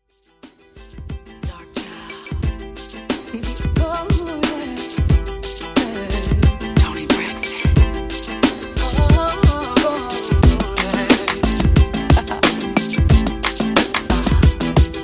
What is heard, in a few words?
RnB Old Skool, 90 Bpm; ReDrum